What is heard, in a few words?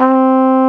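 SOFT FLUGEL C3; AKAI S-Series CD-ROM Sound Library VOL-2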